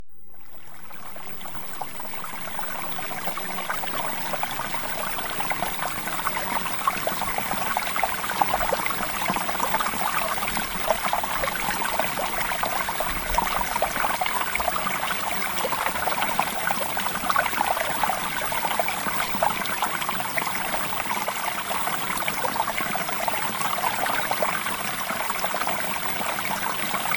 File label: Water; Premium Subliminals